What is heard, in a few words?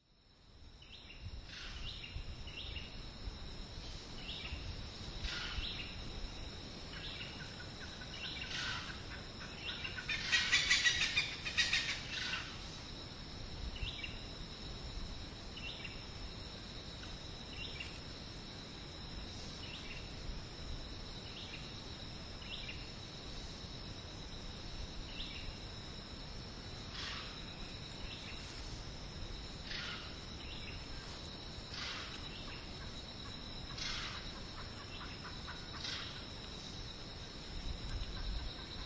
hornbills; healing nature